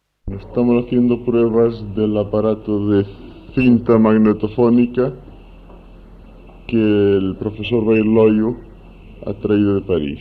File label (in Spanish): asturias voz, musica tradicional